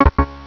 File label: Bomb Tick; Bombzuka; Retro